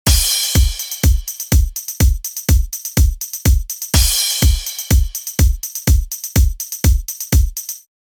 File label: Drum